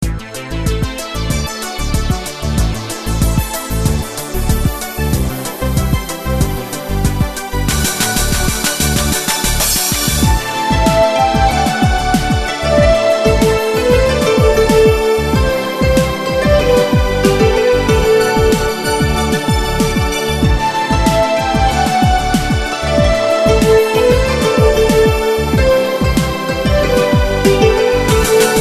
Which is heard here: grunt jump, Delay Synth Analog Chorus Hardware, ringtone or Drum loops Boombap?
ringtone